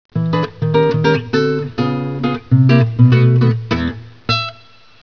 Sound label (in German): Gitarre